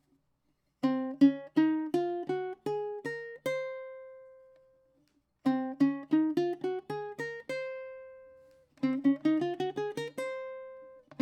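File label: C# Locrian; escalas; Locrio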